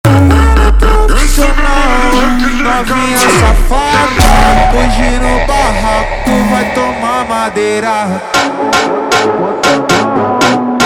Funk Slowed

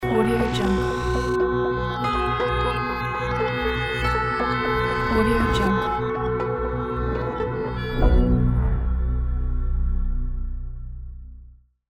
techy logo reveal

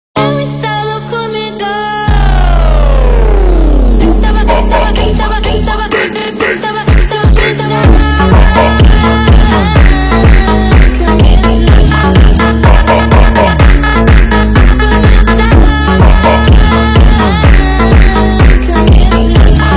Phonk

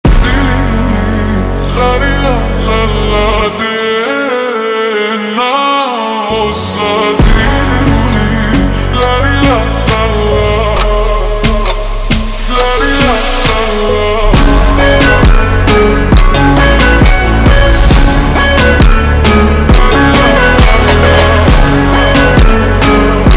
Funk super Slowed

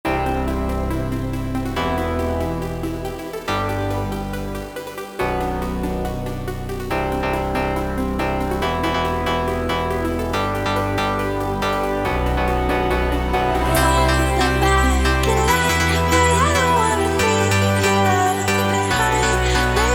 Funk

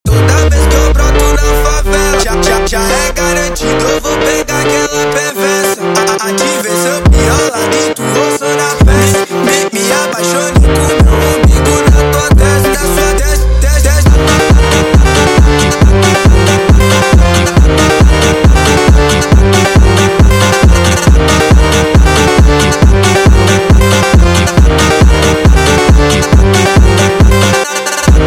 sped Up

